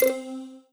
Hit; Indigo; 16bit

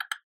left click

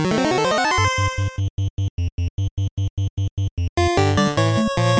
battle scene; final-fantasy

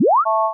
boot, terminal hacker; agentmag

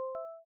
stop bell

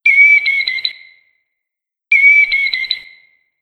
bird

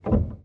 piano

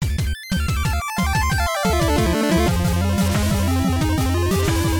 mission failed; indiana-jones

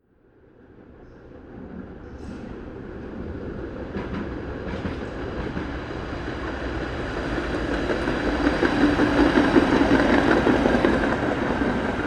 Streetcar passing; 3dstreet

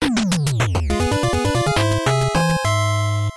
mission completed; cobra-command

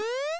jump

